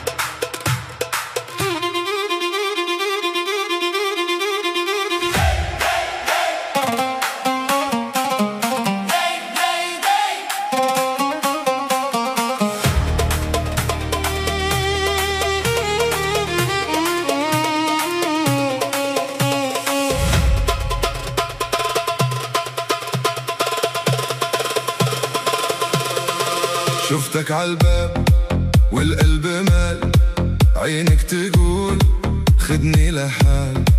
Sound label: Arabic Deep House Techno Afro House Mix